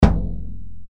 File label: drum